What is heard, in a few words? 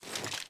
ar reload fast shake, weapons; viper